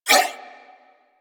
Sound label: Nunchaku Prime Whoosh, Weapons; Warframe; Tenno